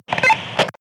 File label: Walkie Talkie Beep